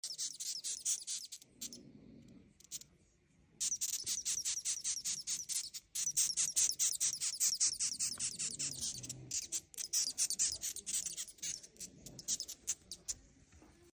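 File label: Moth, insect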